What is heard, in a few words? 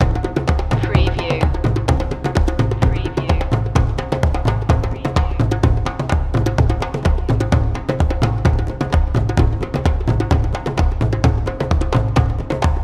african drums bata loop